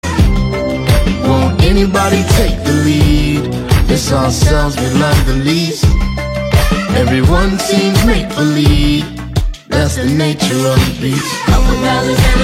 Trailer Song Ringtone; Samaritan

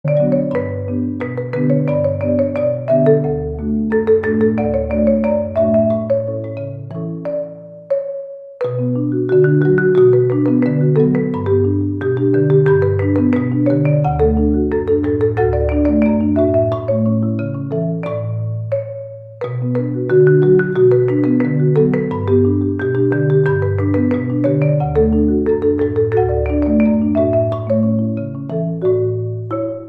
percussion; samsung

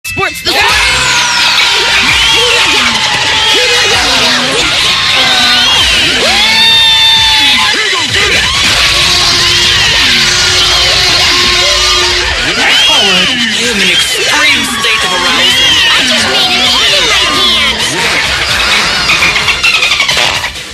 Laugh Ringtones; Preston And Steve

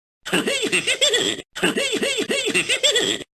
Laugh Sound Effect; Scooby Doo

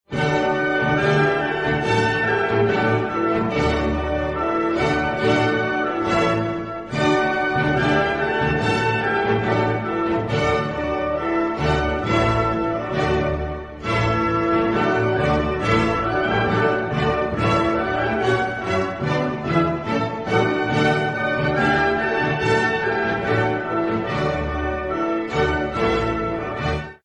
ode to joy ringtone

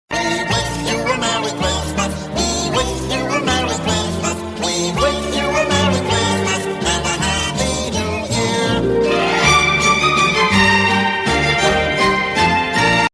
funny christmas ringtones

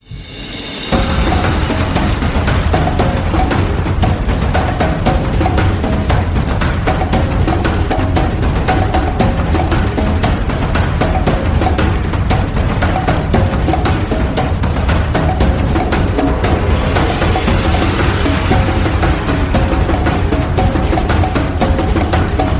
drums ringtone

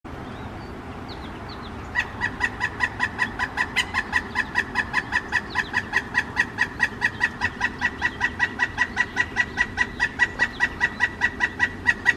ivory billed woodpecker Voice

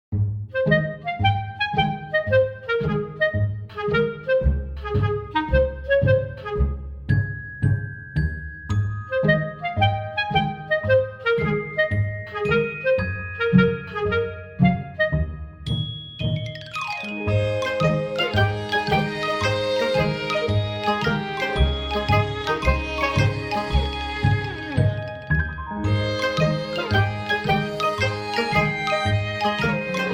bgm heartwarming

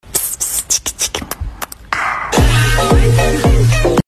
Kiss Kiss chik chik Puk puk aah

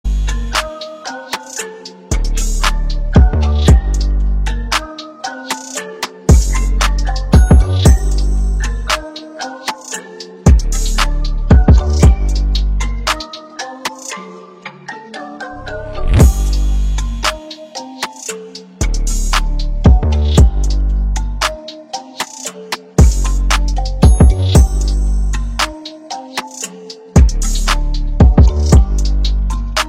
New iPhone SE Ringtone

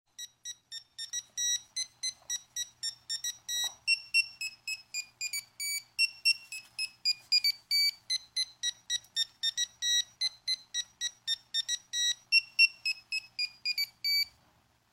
keypad mobile ringtone; Sprint

